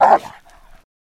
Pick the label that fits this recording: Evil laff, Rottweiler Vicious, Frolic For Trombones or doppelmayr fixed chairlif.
Rottweiler Vicious